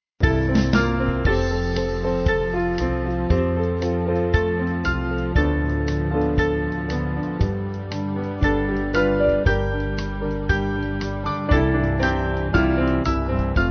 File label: Band